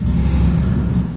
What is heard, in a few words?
wall bit, ambient; hl2; kraken